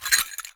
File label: Cowbell Chain, Percussion